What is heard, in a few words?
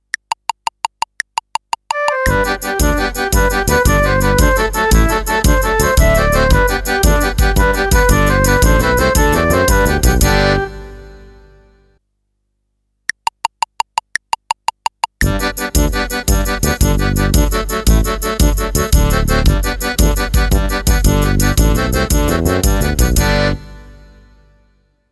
Irish Washerwoman fast, fife